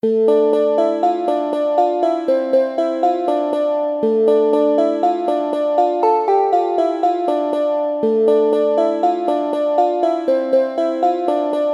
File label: polka